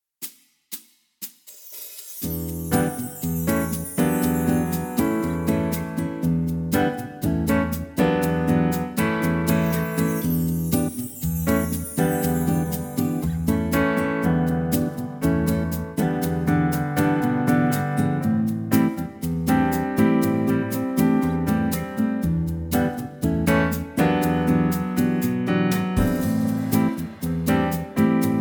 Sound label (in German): vollinstrumentiertes Playback BossaNova E-moll